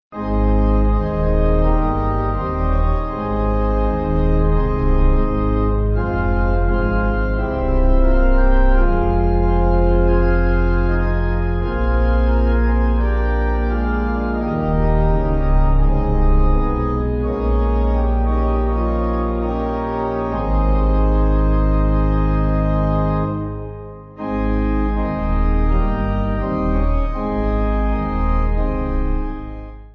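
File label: Pipe Organ, Music Backing Files for Churches; Now The Day Is Over; Merrial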